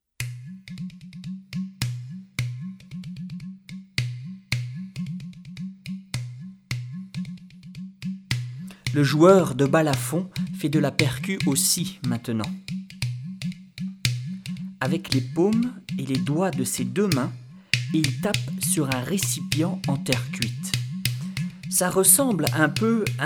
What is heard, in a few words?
Udu